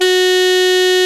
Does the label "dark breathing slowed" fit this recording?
No